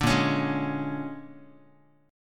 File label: Bb 7th Sharp 9th Bb, Guitar; Bb7#9; strummed; JGuitar